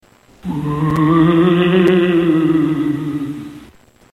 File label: ghost groan